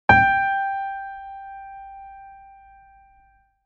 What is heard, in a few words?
Piano